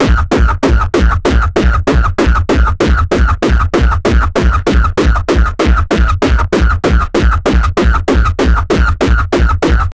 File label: hardcore gabber techno